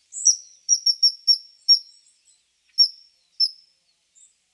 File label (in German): Eisvogel; Romuald Mikusek